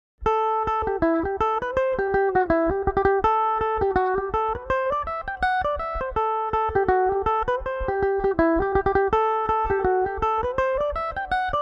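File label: D mixolydian, irish mandolin; last nights joy; belmuse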